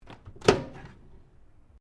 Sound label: OVEN MICROWAVE WHIRLPOOL DOOR OPEN; Appliance Sound Effects; Common oven sounds; Appliances (Sound Effects:Household)